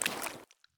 prone puddle; Escape from Tarkov

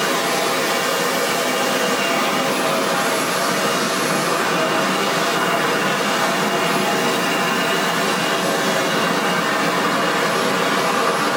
Aircrafts A-10 APU; DCS

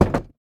wood ladder deploy; Rust

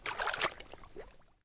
swamp walk; Escape from Tarkov

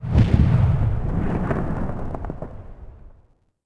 rocket 1shot echo

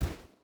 footstep rubber boots sand jump land; Rust

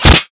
bullet impact body gear stereo; weapons; Modern Warfare

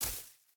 footstep hide grass jump land; Rust

